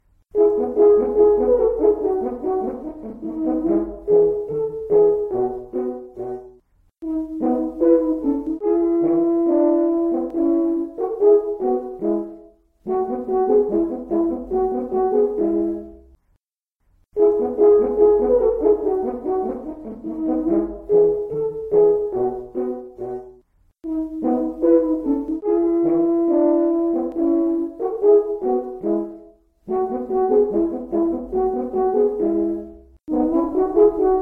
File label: allegretto, 2 horns; Stich J.V.: Duet Nr. 1 Allegretto